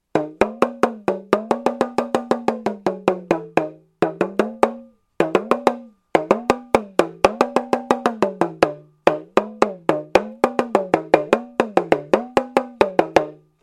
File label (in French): Tambour d'aisselle